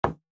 Ball Hit Table, pool; pool8ballmania

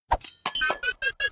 mine throw; star-wars-rebels-team-tactis; sabine

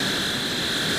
powerups drone loop; spider-man-mysterio-rush-game

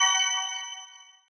create bomb; jewelpetsmatch; jewels

